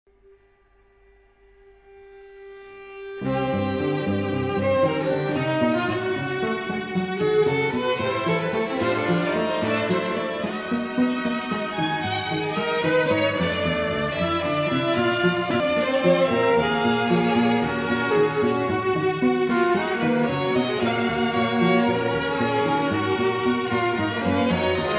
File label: string quartet; Nuorvala Juhani; string quartet2